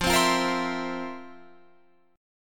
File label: F Major 7th Suspended 4th, Guitar, Open F; strummed